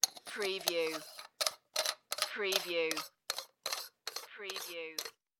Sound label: Metal Cap Screw On